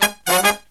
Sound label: Killer Brass Riffs, 108 soul; USB Soundscan vol.29 - Killer Brass Riffs; AKAI